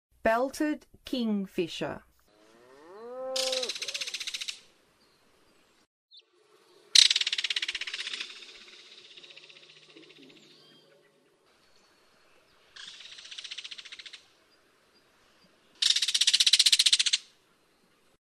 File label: Belted Kingfisher, Songs of Birds of North America; Western USA; Western USA Songbirds